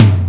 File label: TOM; osu